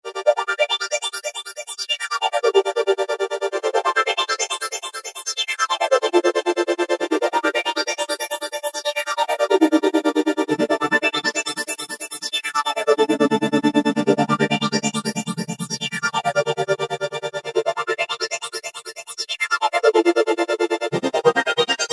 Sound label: Flashin' Pad, Korg microKORG; Factory Voices